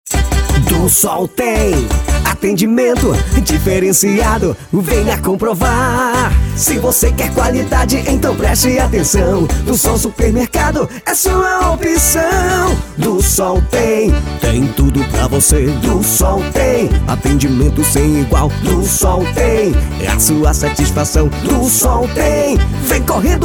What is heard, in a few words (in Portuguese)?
jingle supermercado